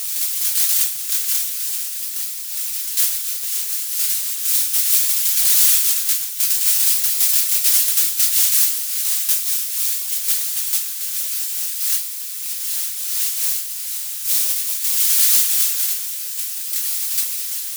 cryo loop; Quake 4 Alpha; ambience cryo

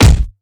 punch; Quake 4 Alpha; strogg tik

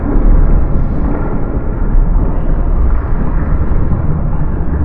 lava muted; Quake 4 Alpha; environment lava

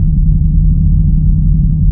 cryo low machine; Quake 4 Alpha

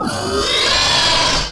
retch sight; Quake 4 Alpha; id software; strogg

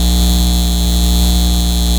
Neon Hum 120bpm, swinging light; DoomRTX

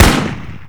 machine Gun Single; DoomRTX; gun revised

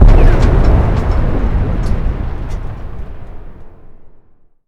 space explo ship; Quake 4 Alpha; id software; vehicles; dropship

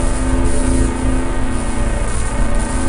grav acquire, weapons gravity; Quake 4 Alpha; id software

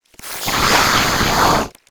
imp sight, monsters; Doom

